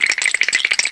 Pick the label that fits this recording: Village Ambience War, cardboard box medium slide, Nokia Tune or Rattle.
Rattle